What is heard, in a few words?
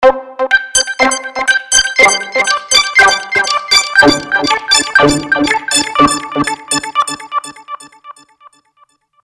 XV2020 Squelch